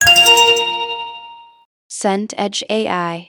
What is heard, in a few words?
chime reward